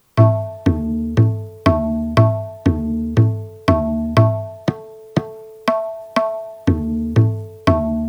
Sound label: Trital, Tabla; Tabla-Transcriber